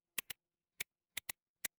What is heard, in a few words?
mechanical clock tick